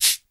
SHAKER; Crypton - DrumBase5000

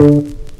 BASS SHRT; E-MU Producer Series Vol. 8 – Platinum Phatt; MoPhattInstrmts

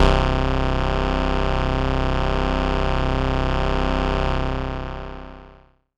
pad C2, Korg MS-20; Pads; Polyend; Simulacrum Sounds; 1 Shots; Synths

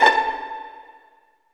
STR PIZZ, String Sections, Violins Pizz; Roland LCDP13

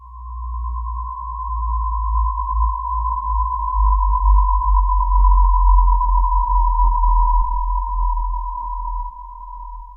DRONE MID; Zero-G Ambient 1; WEIGHTLESS

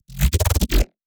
Reese Bass; Virtual Riot

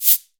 Cabasa Long, Perc; LA Perc; Akai MPC-500

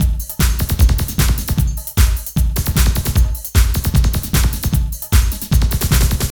loops 140 bpm; Masterbits - Tekkno Trax

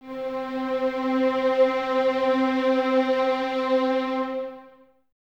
violins C 4, 23 Violins, String Ensembles; Miroslav Vitous

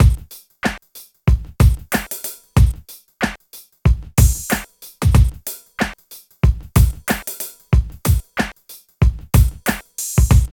LOOP; East West - Phat & Phunky CD2; 90's Sample CDs

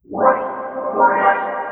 ORCHFX, orchestra, Asian Traditions

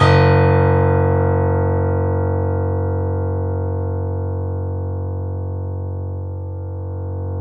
F#, 3-D Audio Collection, 3D Pianos, Yamaha C7; E-MU Producer Series Vol. 5

